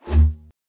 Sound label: whoosh, pong; CosmicRage